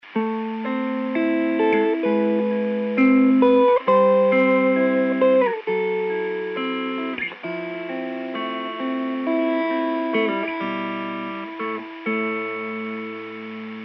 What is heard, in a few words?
revalver clean